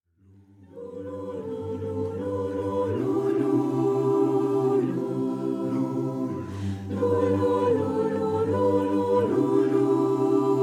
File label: Lullabye, Gospelchor